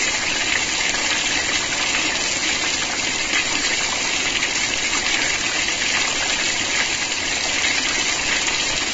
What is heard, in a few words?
water; Alien Arena